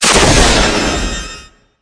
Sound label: psionic resist